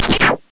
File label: powerful energy gun